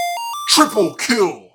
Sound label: jingle streak